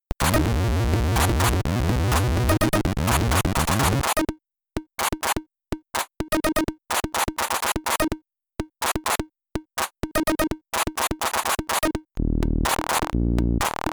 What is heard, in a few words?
dark storm